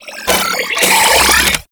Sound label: Reload, Weapons; Warframe; Cephalon; Ceph Primary